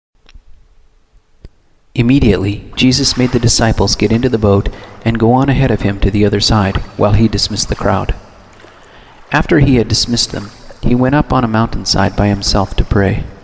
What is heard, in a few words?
Wind waves worship